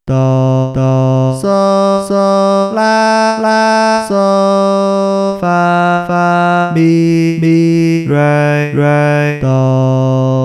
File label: twinkle, solfege; Twinkle twinkle little star